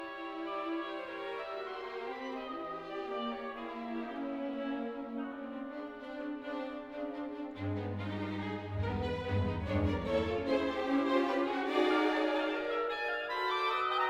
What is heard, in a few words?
toccata for strings solo winds